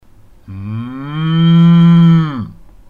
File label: moo